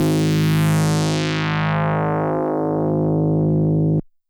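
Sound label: DX100 D#0; rocket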